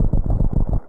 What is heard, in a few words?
Statue Raise; Metroid (USA)